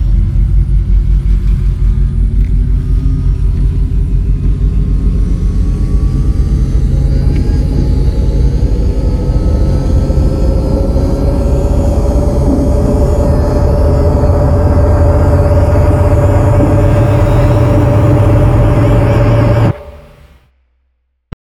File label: graviton charge; starruler; artifacts